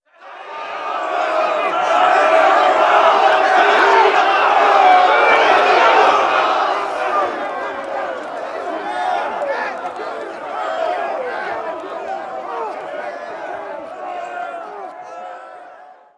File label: walla arrive; Modern Warfare